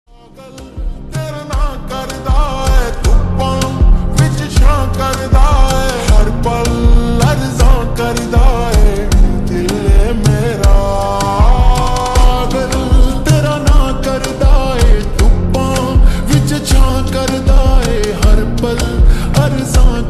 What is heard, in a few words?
Slowed Reverb Ringtone